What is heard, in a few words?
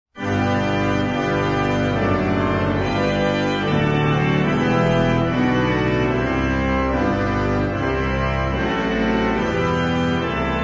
Choral, Orgeln; Frech; Hist Orgeln